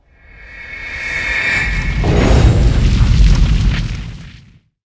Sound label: gate dead, monster; por gate